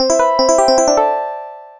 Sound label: achievement